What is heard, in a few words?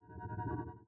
gallery Exit; starwars; episode vii the force awakens